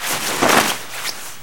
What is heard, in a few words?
zipline clothing, combine soldier; npc; Half Life 2; Episode 1